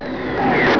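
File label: SCREAM OFF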